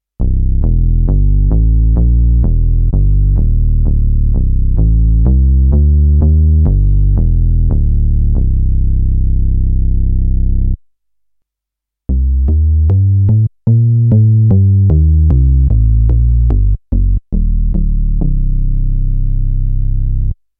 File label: 2 basses; minimax; plugiator